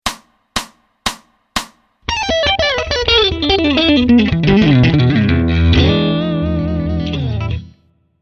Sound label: Blazing Blues Pent fast